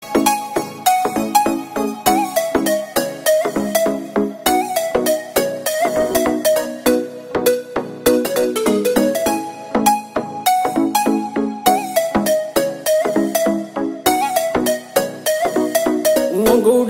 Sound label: Love Bgm Tone